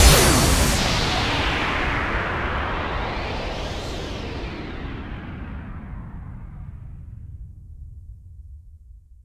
POW; XXL 800 Production Effects

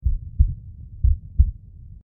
heart

